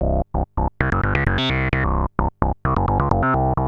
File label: Analoop, Industrial Analog